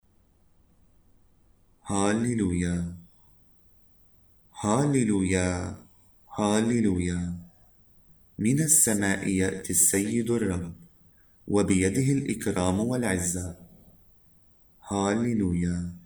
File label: Halleluiah, arabic mass